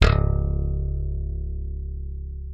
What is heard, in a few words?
C#1, slap thumb; Xsample VOL-1